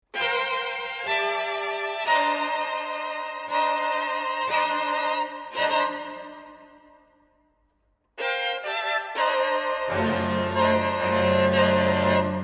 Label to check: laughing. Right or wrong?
Wrong